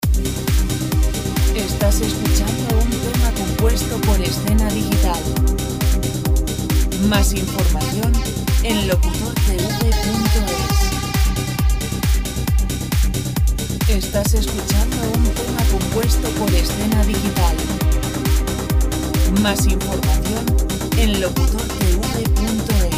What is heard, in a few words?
dance